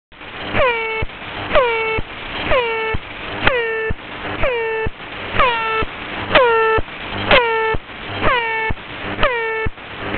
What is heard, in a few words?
airhorn, 4020; websdr